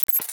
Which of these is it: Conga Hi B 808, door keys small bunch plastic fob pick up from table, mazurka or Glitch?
Glitch